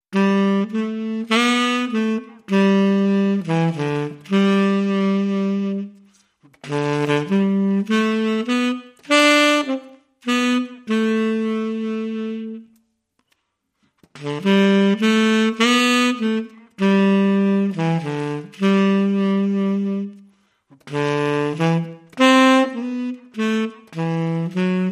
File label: On A Sunny Sunday, Jazz Club Saxophone Only; 30sec